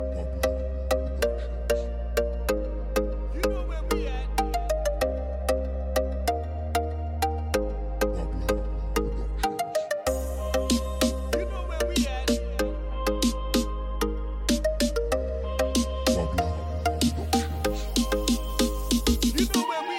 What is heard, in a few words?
Reggae Dancehall